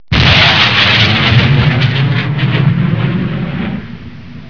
SONIC BM

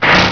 BANG

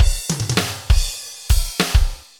FILLS, ROCK POP DRUM; MAGIX

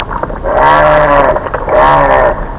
DUCKS; ANIMALS

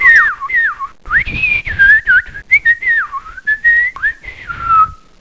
PFEIFFE

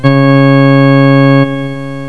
ORGAN NOTE

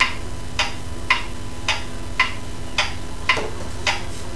CLOCK; SCALA

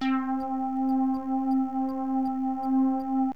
JUPITER; cubase; CUBASIS; SYNTH; JUPITER6

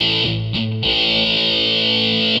GUITAR, ROCK POP; MAGIX

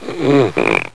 SNORE

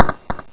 WALK

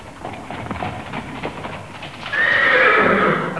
HORSE

